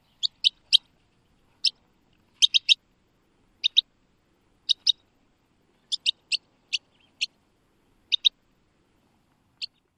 calls

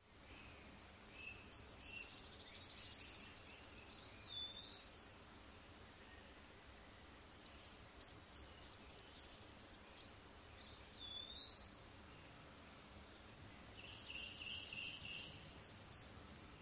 complex songs

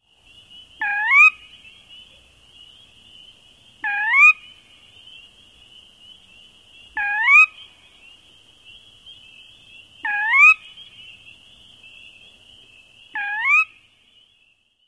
Sora kerwee

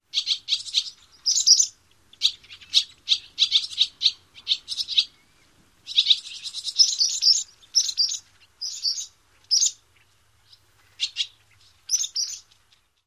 other calls

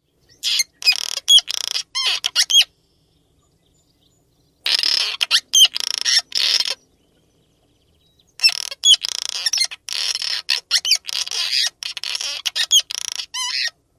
song

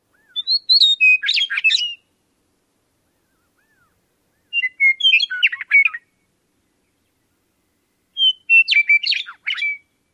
song

